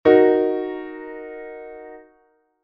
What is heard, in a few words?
D♯m chord inversion